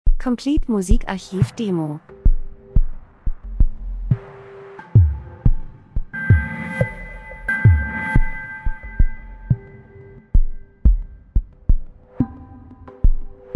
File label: drums; Herzschlag Elektro Rhythmus Spannung Akzent Maschine Produktion Nachdenklich Dokumentation Industrie